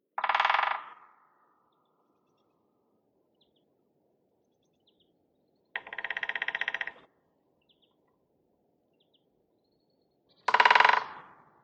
woodpecker

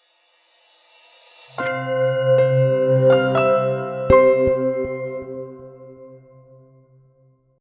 Soundlogo

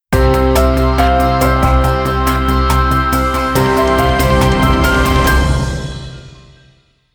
musical signature; gefco

